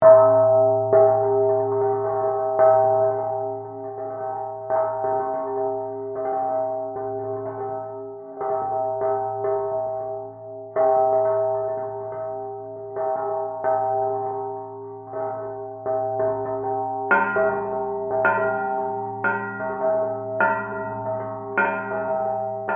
Musical Bells, Production, Element, Musical, Bells, Chimes, Rings, Low, Eerie; Musical Sound Design Sound Effects; BlastWave